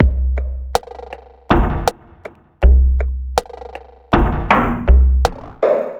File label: Machine Drums C; Ударные и барабаны (Downbeat); 80 BPM; Downtempo; даунбит